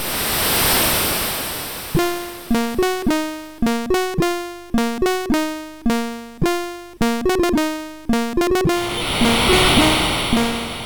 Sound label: Carrillon sid MOS6581R4